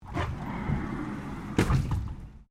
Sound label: Door Slide Industrial Freezer Close Stereo, Industrial freezer sliding door